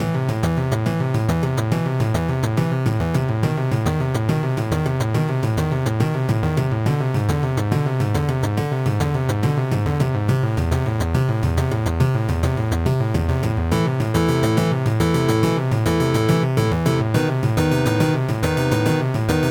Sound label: battle; project-hawk